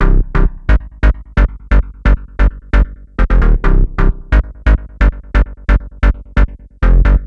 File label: 132 House Arp Cmaj; Dance DJ; Synth; Arps; Pro Tools Essential Loops; Digidesign